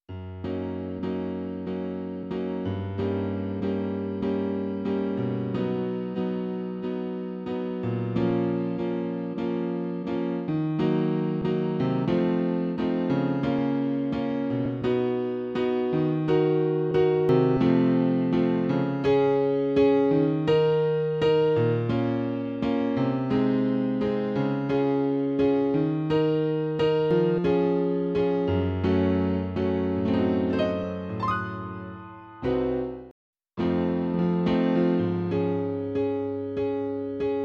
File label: Prologue Instrumental